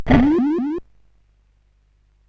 Die Alien Slime, CSG8580R5

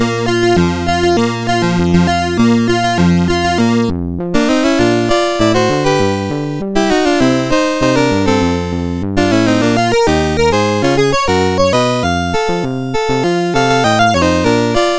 MOS6581; MOS6581R4

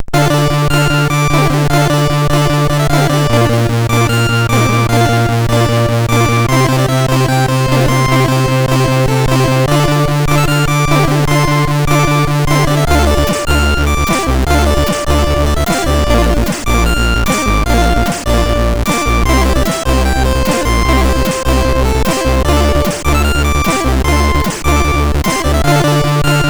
sid, MOS6581R4

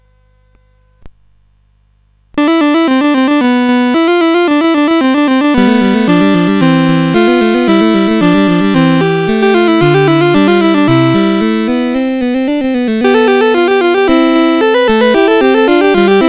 Fugue, CSG8580R5; Fugue VIII 5

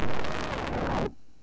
tiger growling, MOS6581R3; Sound Effects

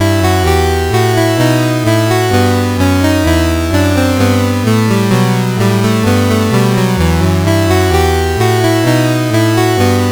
Bourree in E Minor, CSG8580R5; Uncle Slam

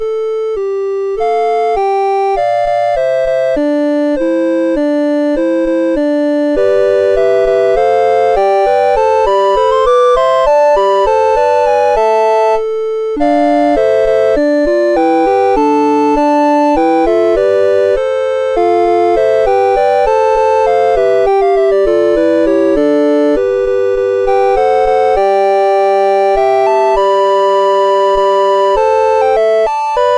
MOS6581R4

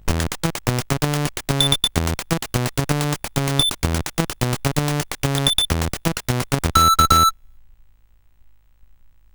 sid, CSG8580R5; Coco-Notes

